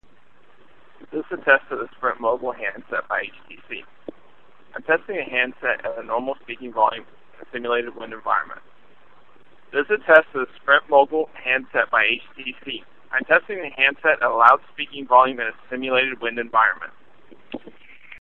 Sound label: Wind